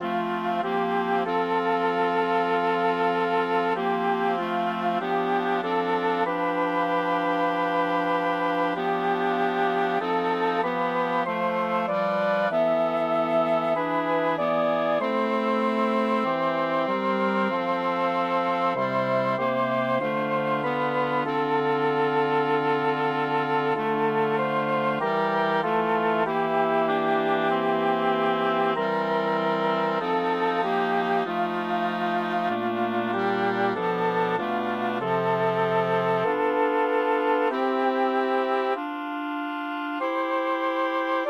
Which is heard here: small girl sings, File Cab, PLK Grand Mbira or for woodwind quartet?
for woodwind quartet